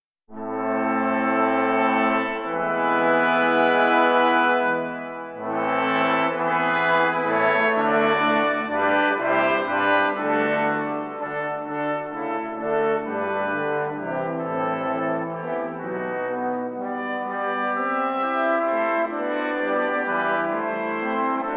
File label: instrumental